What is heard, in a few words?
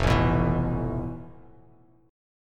F Major Sharp 11th, Bass Standard; strummed; JGuitar